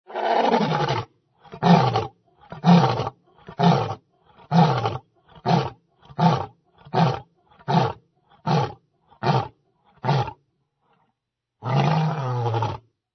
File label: ANIMAL LION